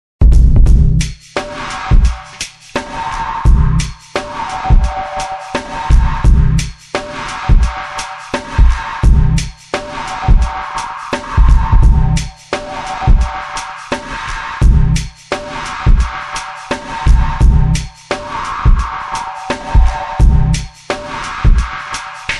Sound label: drums processed